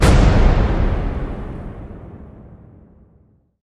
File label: hit big; Close The Book